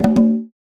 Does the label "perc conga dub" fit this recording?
Yes